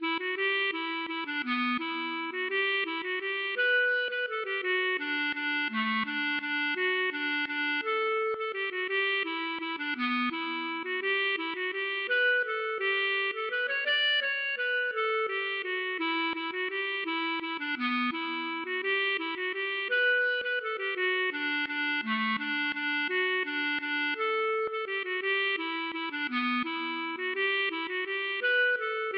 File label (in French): valse